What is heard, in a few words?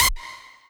lead